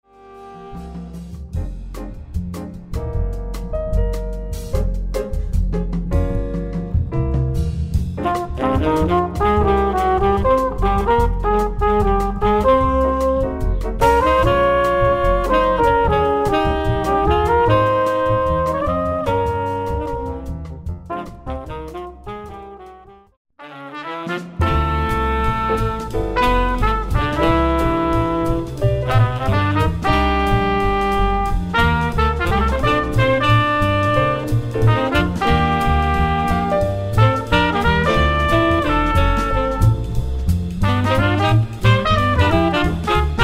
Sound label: 5tet Remix